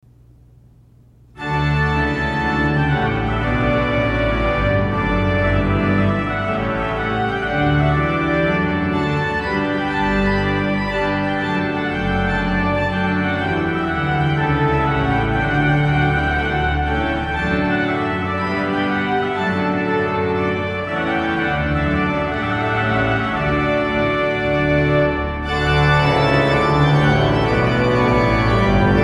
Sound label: Trumpet Voluntary; weddings at old st josephs